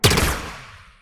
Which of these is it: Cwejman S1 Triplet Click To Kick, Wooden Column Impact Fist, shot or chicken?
shot